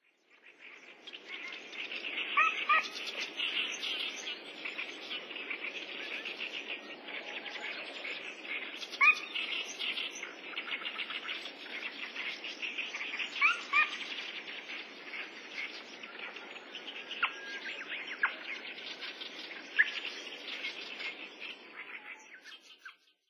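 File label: Ruddy-breasted Crake; hikuina; watarase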